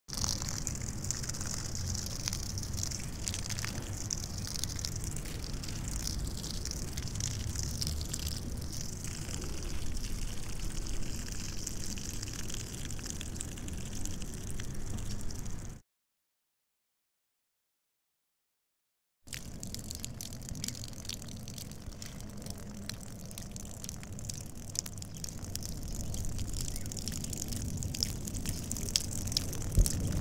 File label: Pissing Urinating Sound Effect